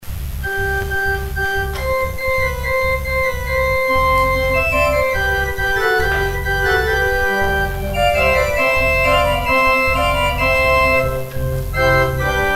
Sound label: organ interlude